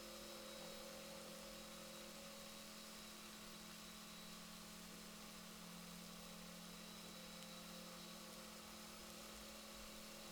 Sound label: rorquals positif, Balaenoptera Physalus Chile